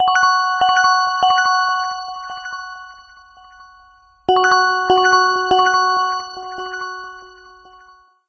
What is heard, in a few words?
Notes, ringtones; Honor; X8b